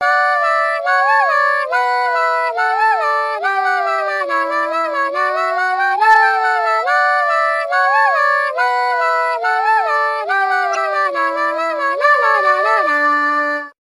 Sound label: Alarm; Miku